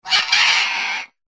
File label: tookie squawk; tiki-beats; eyecon